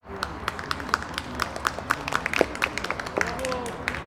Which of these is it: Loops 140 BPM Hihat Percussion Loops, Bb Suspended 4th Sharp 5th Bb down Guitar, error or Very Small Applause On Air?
Very Small Applause On Air